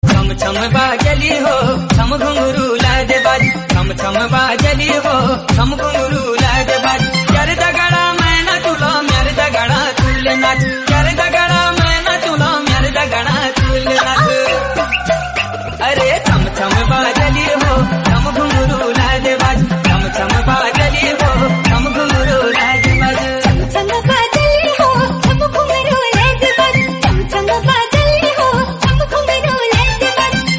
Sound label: Garhwali Ringtone